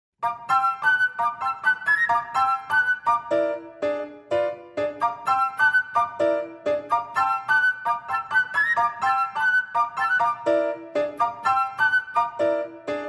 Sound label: Flute